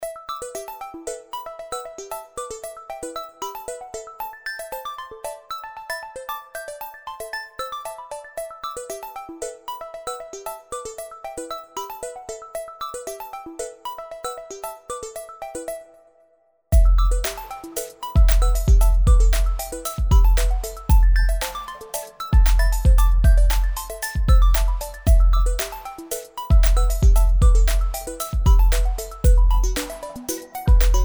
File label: electronic text